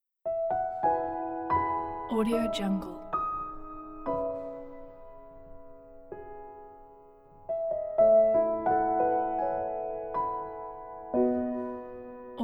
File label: sentimental moment solo piano